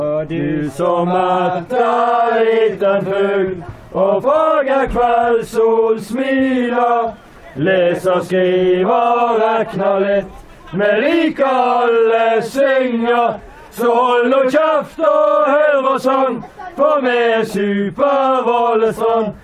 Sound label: cheer, fanchants; valestrand